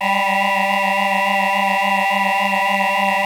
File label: PPG VOX A G4; DIG VOX; Music Store - Claudius Diemeter Schwerlos